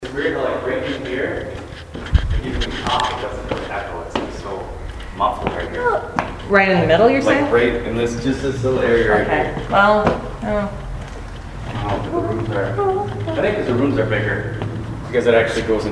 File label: office area during walk thru; paradise theatre